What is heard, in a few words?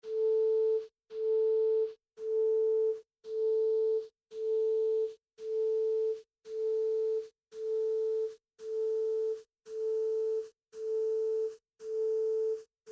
Oboe